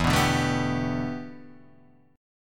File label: A 7th Suspended 2nd Suspended 4th